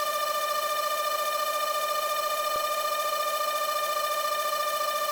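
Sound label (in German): Sound aquidistant 1500 rpm; maschinenakustik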